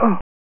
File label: Champion Wounded, Amiga; Dungeon Master and Chaos Strikes Back